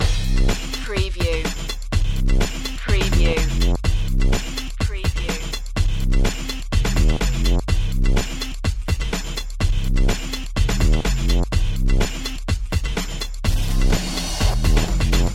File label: stutter loop